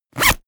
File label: zipper open close